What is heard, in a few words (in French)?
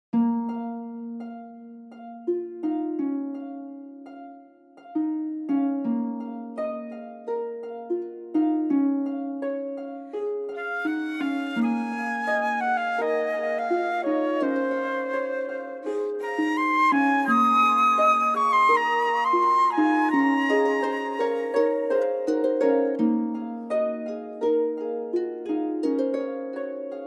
instrumental